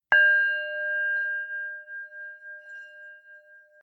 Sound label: little Gong